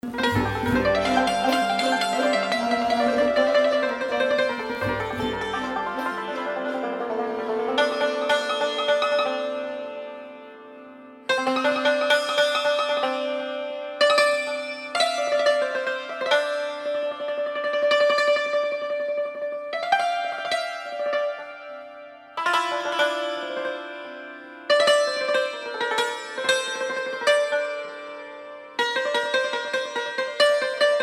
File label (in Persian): Taknavazi Santoor; تکنواز سنتور